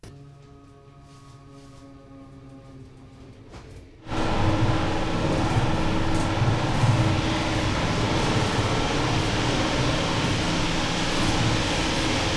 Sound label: car wash; best service Blue Box